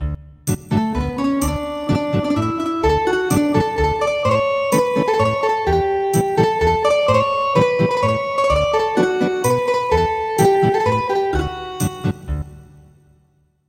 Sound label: Ethnic Guitar